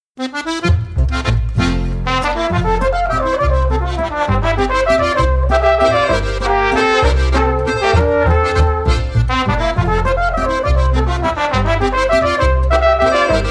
walzer

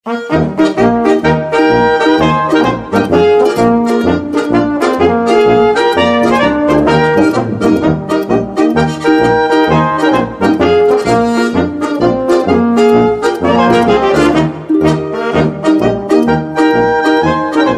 polka; goldegger-polka

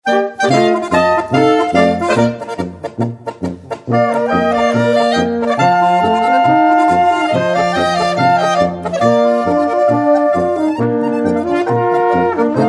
axamer polka

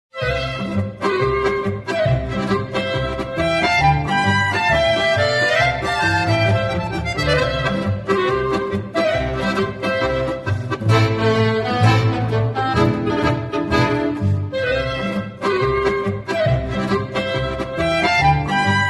polka

